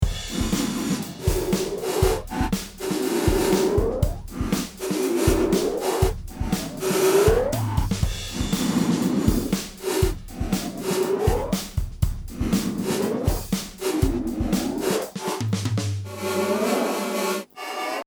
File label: Blur Drumloop Dimension